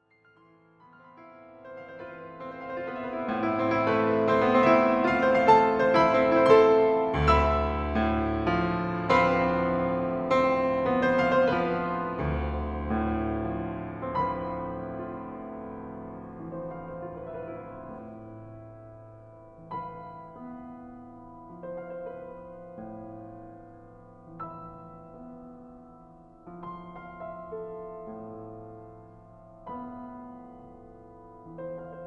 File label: Tango no Tango